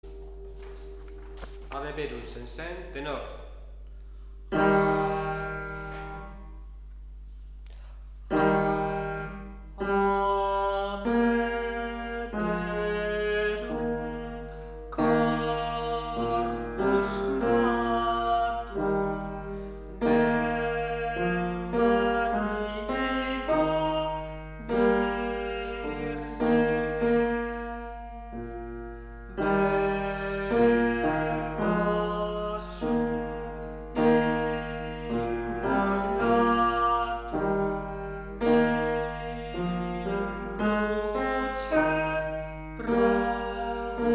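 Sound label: tenor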